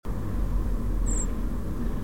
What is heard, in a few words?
Turdus merula; SETE